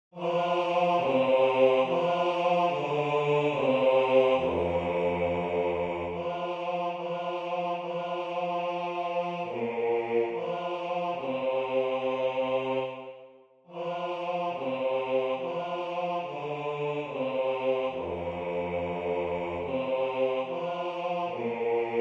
AVE MARIA Bass; JACOB ARCADELT

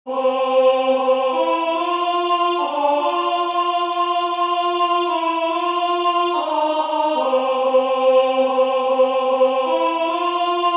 Alto; ALS ICK U VINDE

